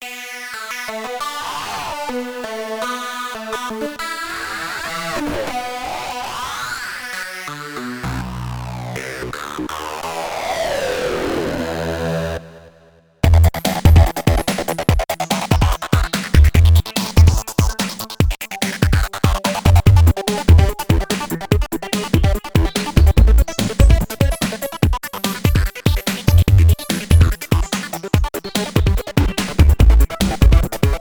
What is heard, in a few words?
Wired Lead, Korg Radias